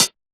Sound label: Cl Hat, Roland MV8800; A D KIT